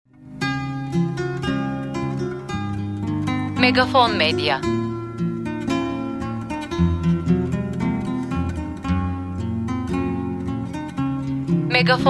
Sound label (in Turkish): SANTRAL MÜZİK